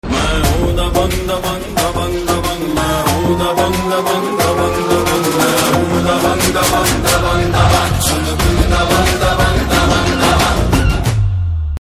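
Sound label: Trailer Theme Ringtone; Dabangg 3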